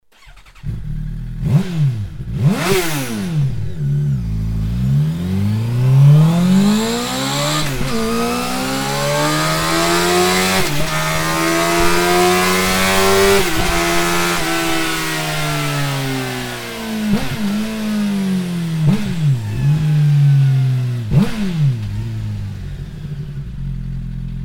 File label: Akrapovic Slip On Suzuki GSXR750